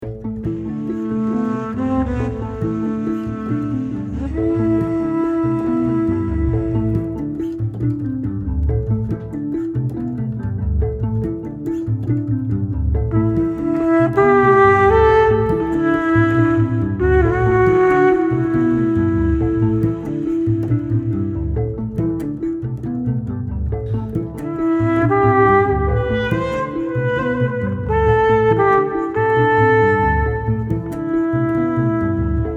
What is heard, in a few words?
solo